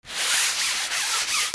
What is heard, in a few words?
FRICTION